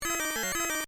Computer Readout, Scifi, Computer, Readout, Data, System, Musical, Science, Fiction; Blast Wave